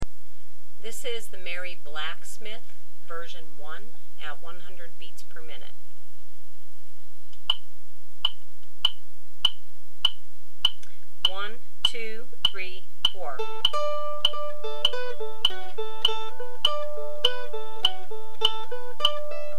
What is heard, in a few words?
100bpm, mandolin